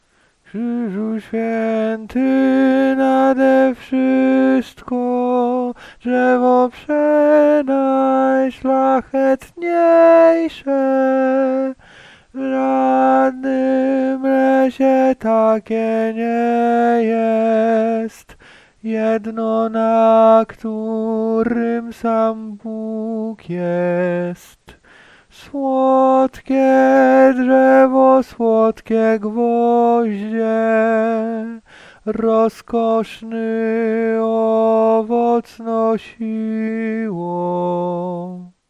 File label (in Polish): tenor; Krzyzu Swiety; Wielki Czwartek; spiew na triduum